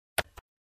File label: Keyboard Button Press